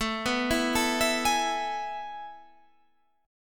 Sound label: A Major 7th Suspended 2nd